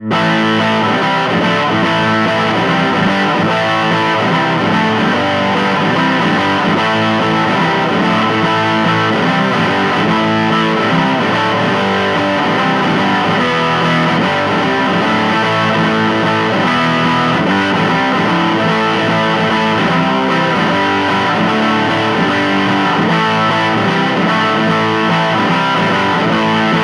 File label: Street Punk Blues